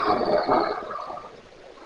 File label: more laughter